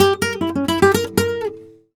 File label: f#, Flamenco Guitar Picado; Picado 1